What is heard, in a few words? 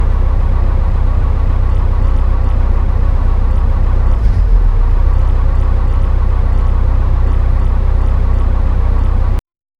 soft bus engine hum